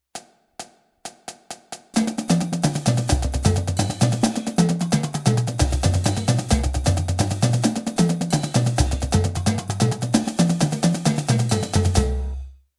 Chuggeda Flamtaps 132

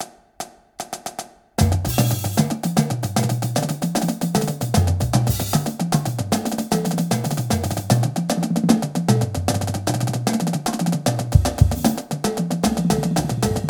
Gouda - 152, drumline

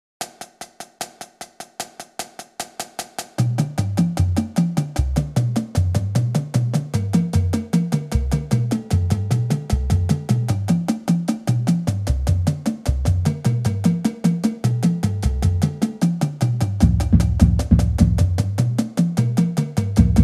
bass splits 152, drumline exercise

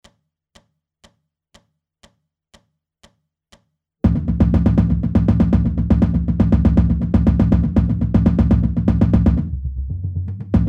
bass only slow, drumline; Ditty

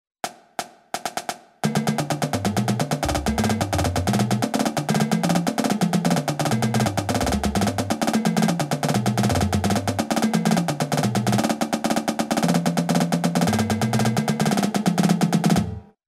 Grid diddle 172 (midi), drumline; Triplet Grid- (172)

